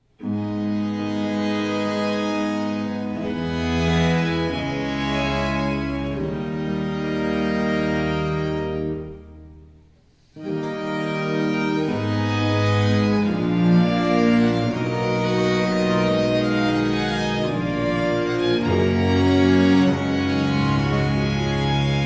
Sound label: sonata in a minor